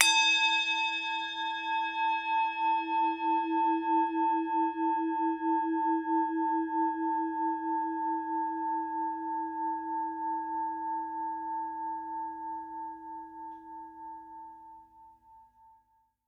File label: Tibetan Bowl Bol Tibet Attac